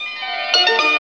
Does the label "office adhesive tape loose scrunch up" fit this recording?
No